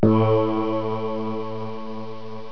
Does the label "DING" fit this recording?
Yes